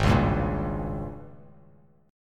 Ab Major 7th Suspended 2nd Suspended 4th; AbM7sus2sus4; 4,4,5,3; strummed; chordshape; JGuitar

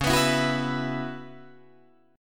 C sharp Minor 11th C sharp, Guitar Drop D

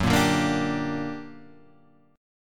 G Minor Major 7th, Gb, guitar chord

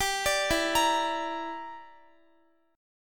E Minor 7th Flat 5th, Guitar; Em7b5; arpeggiated; x,x,17,19,16,17

